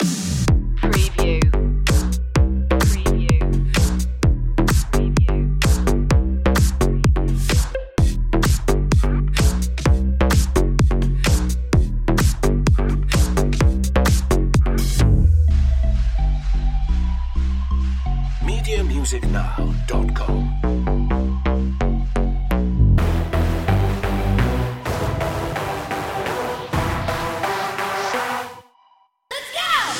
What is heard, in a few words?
Long edit for looping. Fresh and cool EDM / Hip-Hop; dance; club beats